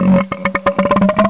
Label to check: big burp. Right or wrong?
Right